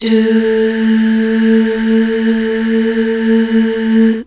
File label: DUUH FM R, Hallelujah, BACKGROUND